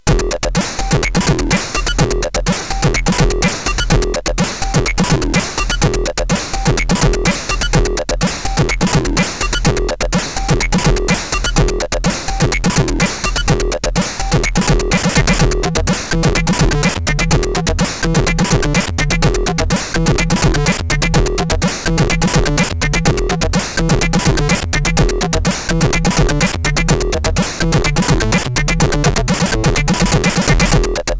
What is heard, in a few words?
Newsynth, sid CSG8580R5; Morton Adam